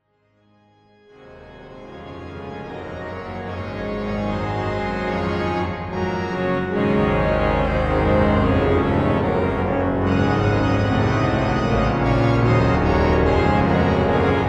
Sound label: Sonate; Hippolytus Rheinberger